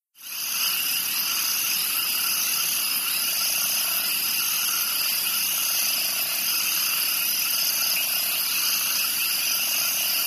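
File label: Background Crickets Peepers Crickets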